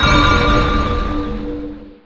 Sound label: Magic FREEZE